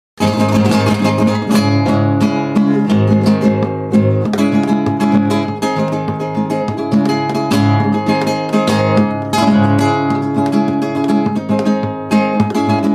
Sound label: tango del negrito, guitar; spanish